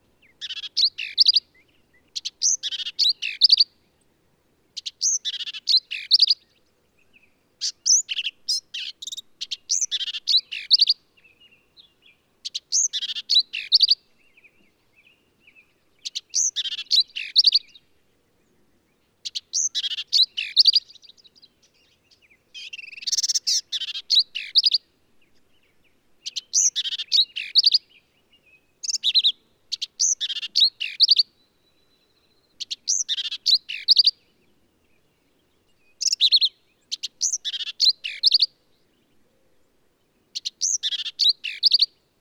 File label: Black-capped Vireo